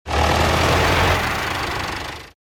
Tractor Rev; disney; cars

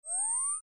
section Enter; starwars; episode vii the force awakens

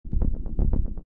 background rumble; avengers

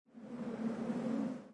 Whoosh; prep and landing

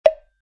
woodblock; camp rock; rock n row

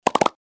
parts off multiple; toystory; mrs potatohead

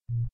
leftnav rollover; Cars

